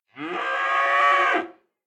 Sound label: cattle; fauna